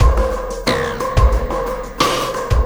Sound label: Sky Lab Beat, Processed Drumkits